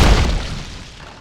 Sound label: attack; tiny